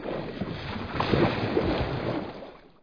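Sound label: sea splashes and breaks on the rocks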